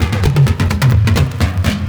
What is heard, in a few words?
PERCS, 70 s Breakbeats